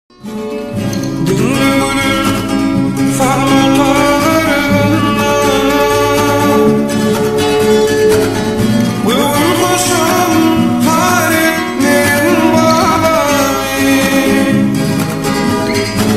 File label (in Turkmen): gitara aydymly echo